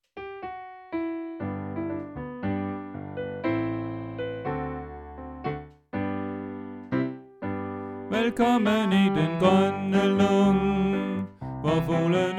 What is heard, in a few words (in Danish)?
Bas; oevefiler